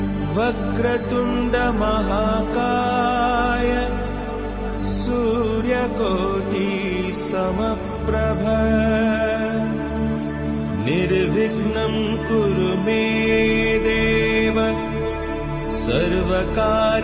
Vakratund Mahakay (Male); Ganesh Chaturthi Special Ringtones

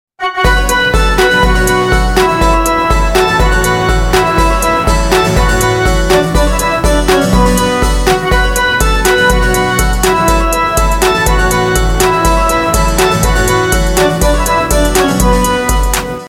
Instrumental Tone; Race 2

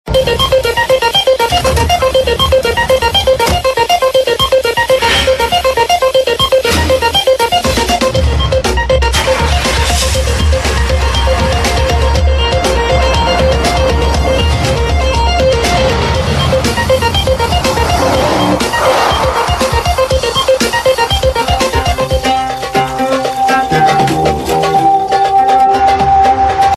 Entry Tune; Anushka; TV Serial Tone; Channel V; Mastaangi

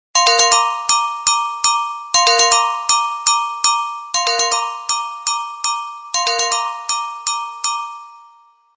Frozen Sms, SMS Tone

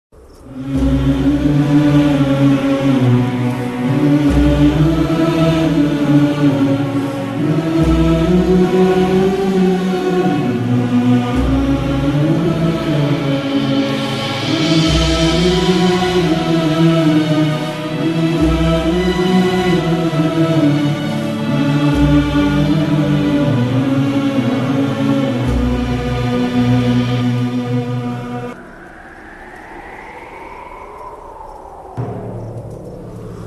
Title Song (Soft Instrumental); Tv Serials; Star Plus; Mahabharat

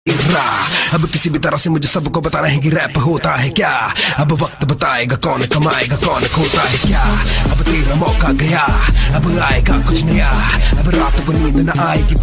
Rap Ringtone

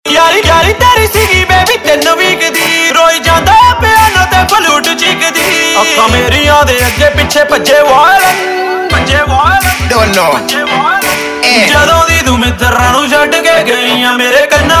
Violiin

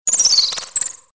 Digital Sms, SMS Tone

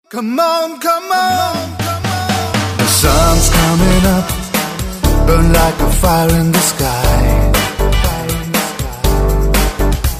Real Active juice TV ad song

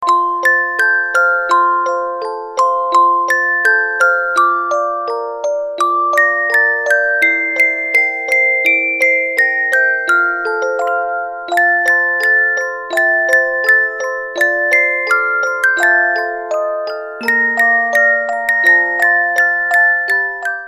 Bells For Xmas; Christmas Special

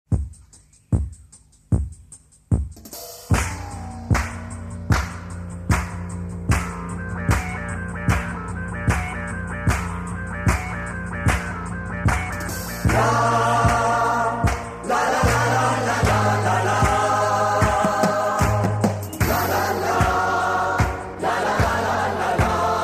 Disco Music - Instrumental; Sahhas (1981)

